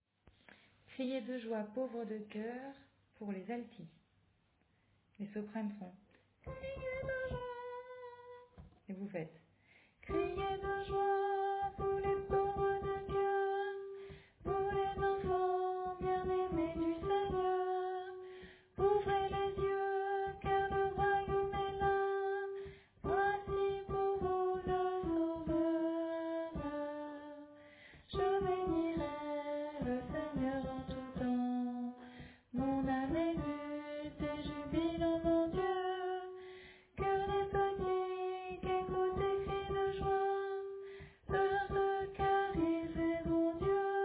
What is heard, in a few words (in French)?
Alto